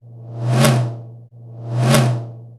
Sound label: tom, drums; CMP - Analogon